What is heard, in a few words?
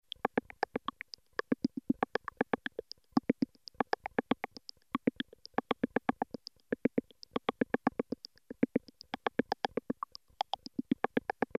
VCO square wave low frequency; Rewire function via CV